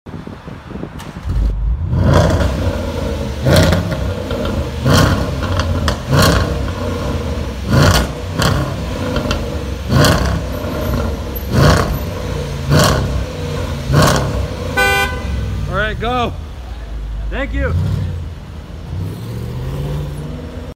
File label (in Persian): صدای منبع اگزوز رنجروری